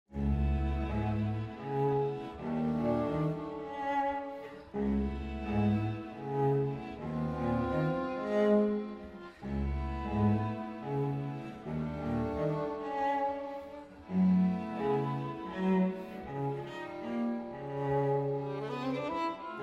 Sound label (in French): Quatuor a cordes; Choveaux